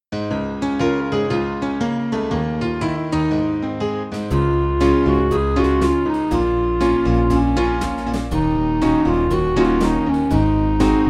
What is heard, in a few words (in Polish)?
0 5 tonu nizej akompaniament